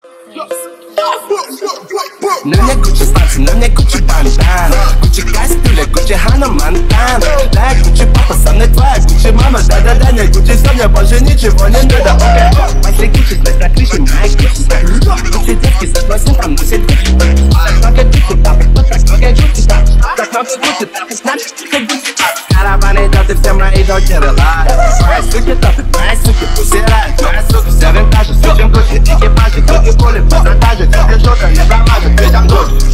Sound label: mashup